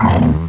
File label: shock trooper pain